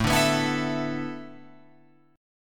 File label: A Suspended 2nd Suspended 4th, Guitar, Open F; Asus2sus4 strummed